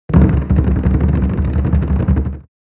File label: Bodhran sus rolls; gfxsounds